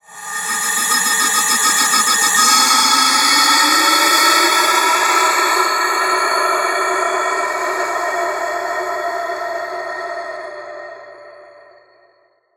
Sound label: Andean Wind Flute; Sonic Foundry (Sony Creative Software); Continental Drift Aftershocks